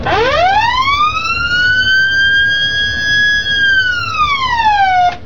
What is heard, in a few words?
signal about a possible collision near water transport, zvuki podvodnoy lodki